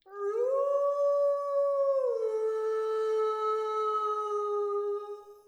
mon wolf, monster

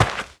walk dirt; player

